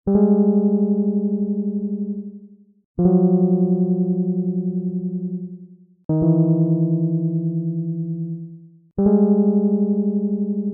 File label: song